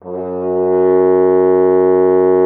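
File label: French horn F#3; Xsample VOL-3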